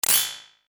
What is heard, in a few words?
burst rough midfrequent